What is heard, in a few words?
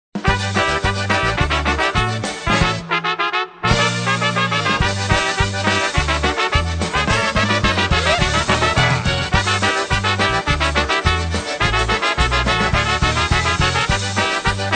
polka